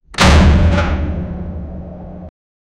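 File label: shelter nuclear gates open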